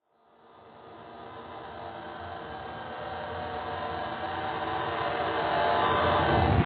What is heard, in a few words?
horror Wind up; sprunki incredibox